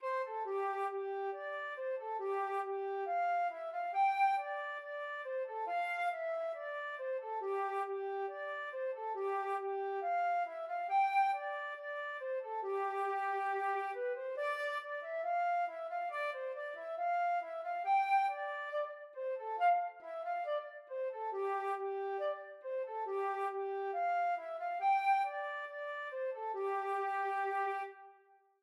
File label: flute